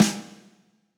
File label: snare